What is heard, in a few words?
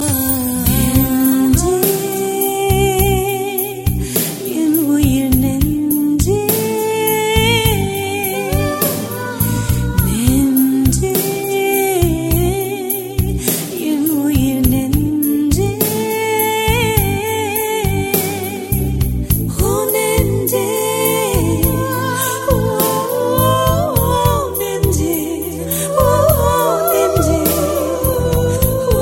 valentine bgm